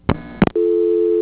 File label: crossbar dialtone